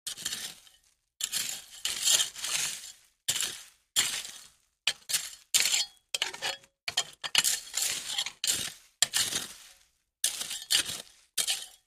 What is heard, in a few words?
Hoe Dirt, gardening